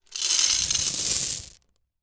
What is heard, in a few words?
marbles lots in glass container tip out onto carpet